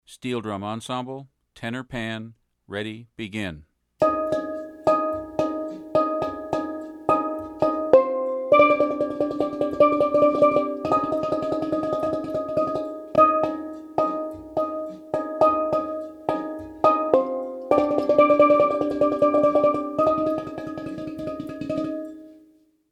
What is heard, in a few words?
Tenor